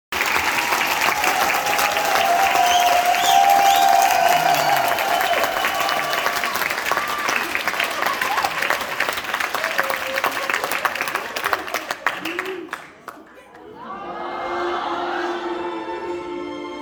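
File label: theatre music applause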